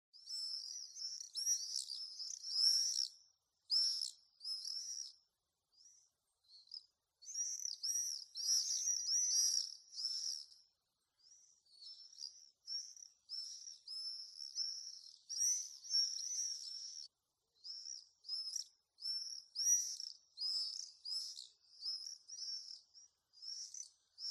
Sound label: Common swift; پرنده بادخورک معمولی